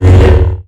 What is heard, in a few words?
Lightsaber; Arduino